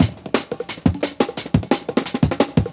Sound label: 175 D&B Drums; Pro Tools Essential Loops; Dance DJ; Drum n Bass